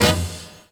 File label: headache hit